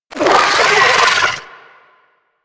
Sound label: Amalgam Moa Satyr Vocal Attack; Warframe